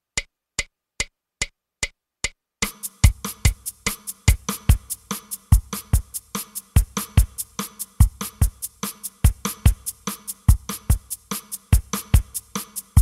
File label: Drums